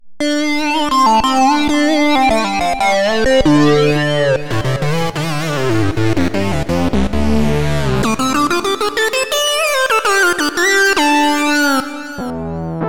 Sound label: Hardsync Presets; OPXPROII; opxpro2; Reloads